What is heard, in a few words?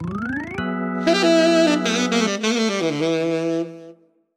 Bonus Game Lost; Mario vs. Donkey Kong